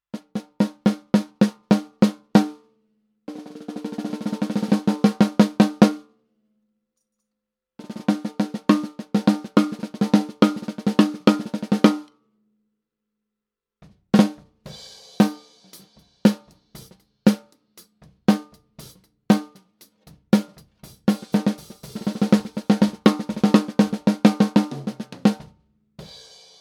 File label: snare